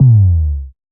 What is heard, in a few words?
Dive Kick; A D KIT